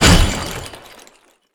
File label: Parts Clattering; Goddard Playing Dead